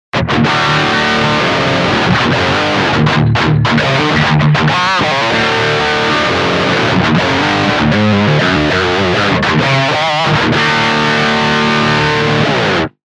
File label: lead, sparkle